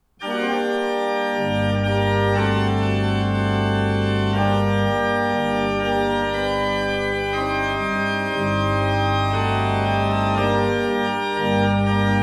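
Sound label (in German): Orgel, pfeifenorgeln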